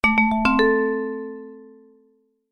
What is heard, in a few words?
multimedia alert chime short musical notification error; zapsplat